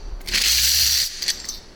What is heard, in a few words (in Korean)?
긁힘